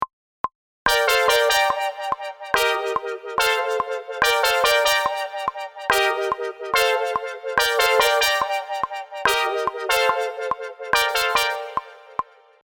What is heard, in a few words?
Intro 2 chord Mix